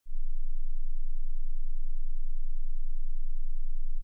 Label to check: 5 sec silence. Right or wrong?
Right